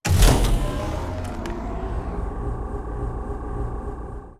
Field Charge Station

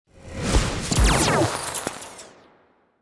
Boss Skill Flash Bang

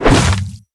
ui build mode demolished; Academy

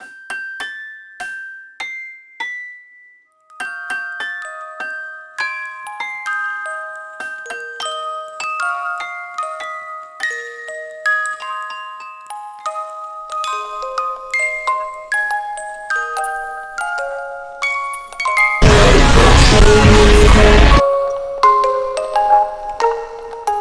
Happy Birthday Music

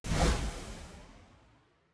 fight start

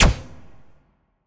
Turrets Shot

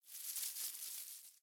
Leaves Fall From Platform

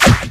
Slash; Nickelodeon Super Brawl Universe; Android

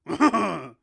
Pirouette

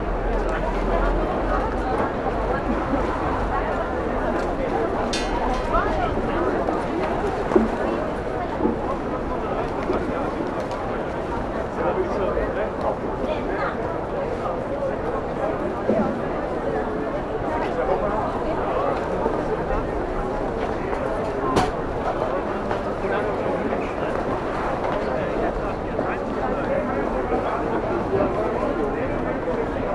Walla; Hunter

